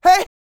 YELL, MALE HUMAN; Spectrasonics Vocal Planet

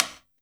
STICKS; InVision Interactive; Percussion Slam

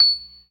PERC, Synth and Organ

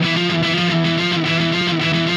Guitar, Indie Rock

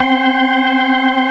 ORGAN ALL STOPS; Keith Emerson Signature Series

